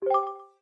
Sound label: Textbox Open; Open a text box